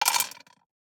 DICE on Glass, Throw and Roll, Standard, 5 Five Dice; Samples; ArticulatedSounds; DICE Sfx Party (Extended)